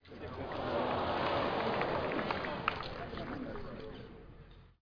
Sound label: crowd triple bogey